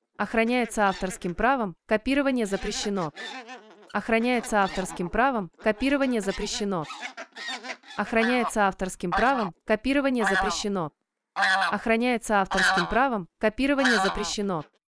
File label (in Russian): гуменник осада сам, крик осады самки гуменника из старой фонотеки с добавлением новых гуменника и белолобого.; гуменник осада самка 2025